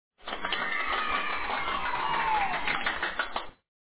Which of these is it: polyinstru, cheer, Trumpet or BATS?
cheer